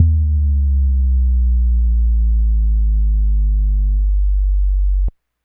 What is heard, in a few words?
bass, Hardcore Breakbeat, 151, Ebmin; Big Fish Audio; Hardcore Breakbeat Action; Rhythms 4 Life 151 Ebmin